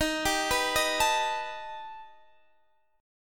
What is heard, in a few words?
B Augmented 7th Eb